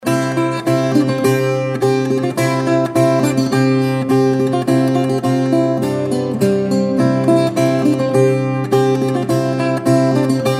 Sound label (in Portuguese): viola brasileira